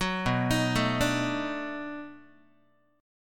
B 7th Sharp 9th, down, Guitar; arpeggiated

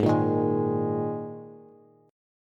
Ab Suspended 2nd chord, Bass; 16,13,13,13; strummed; JGuitar

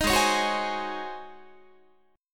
G sharp 11th G sharp, down, Guitar; G#11; strummed; 18,16,16,16,16,19

